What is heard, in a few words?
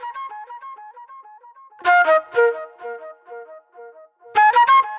NY 70's Delay Flute